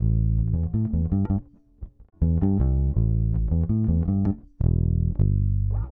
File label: Electric Bass